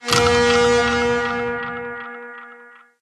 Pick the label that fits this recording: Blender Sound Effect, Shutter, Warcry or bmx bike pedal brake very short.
Warcry